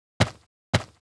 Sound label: run; Monster; 레이드; 고목신마